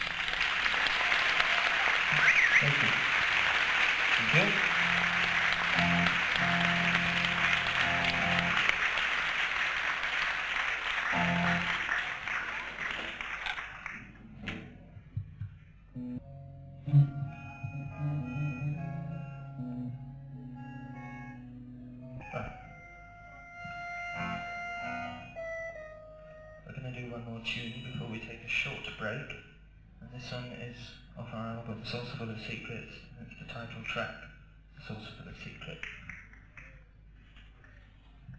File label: Tuning; Lund, Sweden